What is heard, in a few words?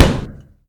Plastic Stone, collision sounds; clockwerk opensim